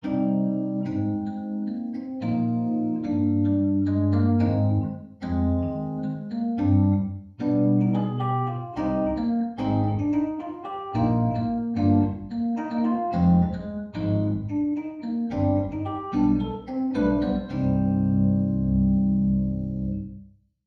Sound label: vintage key wet